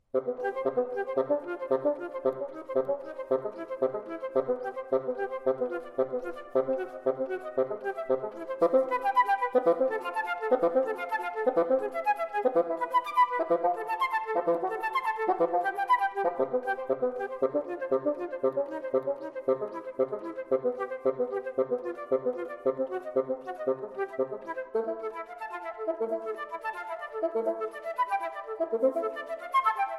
Petite Suite For Flute & Bassoon, Mouvement perpetuel